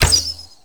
Daikyu Prime Quick Fire Shot A; Tenno; Daikyu Bow

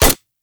Fire Mech; Warframe; Latron Prime

